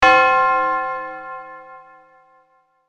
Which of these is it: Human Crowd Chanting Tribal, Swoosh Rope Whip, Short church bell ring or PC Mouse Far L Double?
Short church bell ring